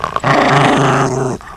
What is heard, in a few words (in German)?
Hund knurrt; geraeusche tiere